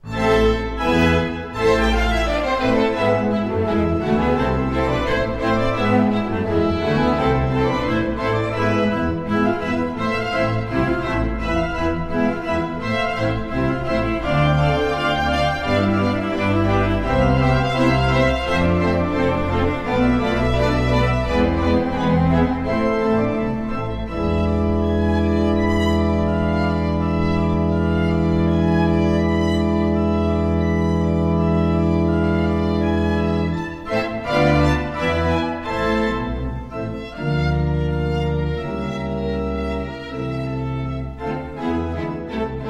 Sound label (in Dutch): Bach orgelconcerto A minor fragment